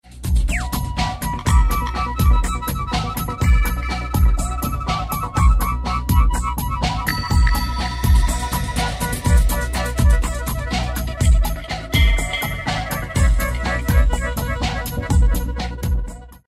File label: Bass And Flute